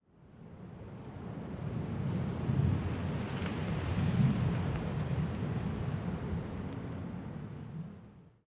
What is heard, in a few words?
wind snippet